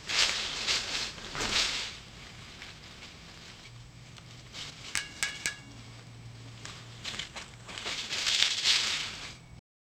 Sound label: the gentle bustling sound, domestic